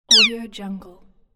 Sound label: laser gun